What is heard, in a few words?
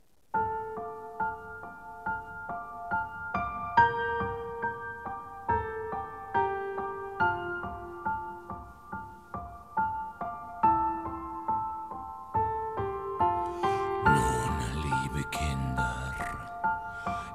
piano version